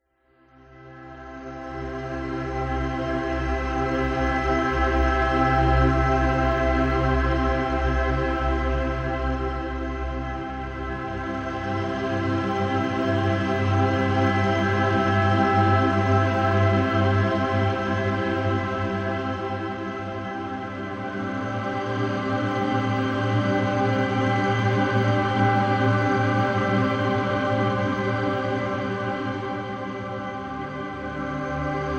For Meditative

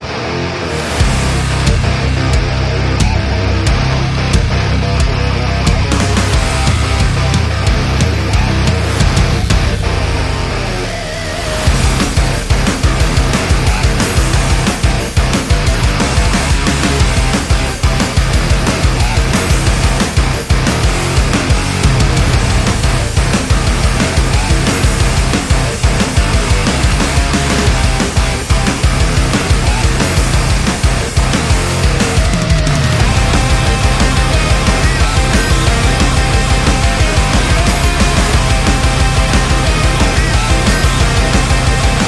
Energetic Hard Metal; action metal and rock music

